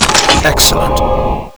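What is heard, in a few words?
weapon ammo; svencoop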